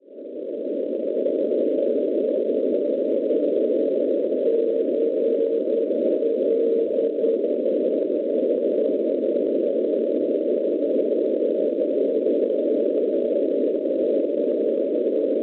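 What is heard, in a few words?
non-directional beacon 463 kHz; CL; Cerklje; Slovenia; Schwarzsee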